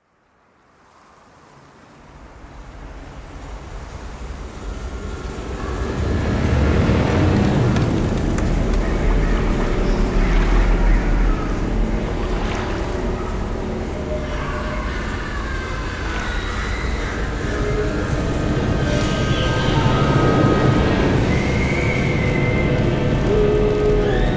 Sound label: Intro Ambience; hero defense haunted island